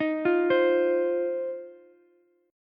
C Minor Double Flat 5th C down Ukulele; Cmbb5 arpeggiated; JGuitar

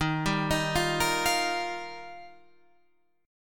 D sharp Major Add 9th, Guitar, Standard; D#add9 arpeggiated; 11,10,13,10,11,13; JGuitar

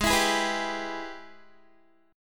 Ab Minor Major 7th Sharp 5th, down, Guitar; strummed; AbmM7#5

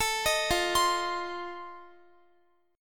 F 7th F down Guitar; arpeggiated

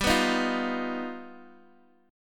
G Augmented 7th G, Guitar Open D; x,x,5,5,6,3; down

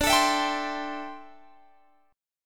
D 7th Suspended 2nd Suspended 4th, Guitar, Open G

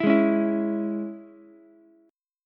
Ab Suspended 4th Sharp 5th chord, Ukulele; strummed; JGuitar

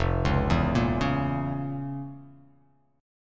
F Major 7th Suspended 4th Sharp 5th

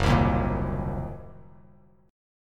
F sharp 6th Flat 5th F sharp down; strummed; JGuitar

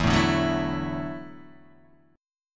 E 9th Suspended 4th E down Guitar Open D

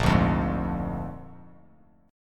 A sharp 7th A sharp, Bass Standard; A#7 strummed; JGuitar

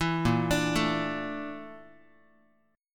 A sharp Major 7th Flat 5th A sharp Guitar; arpeggiated

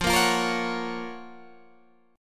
Gb Major 7th F, guitar

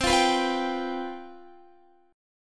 C Major 7th Suspended 4th C down Guitar; strummed

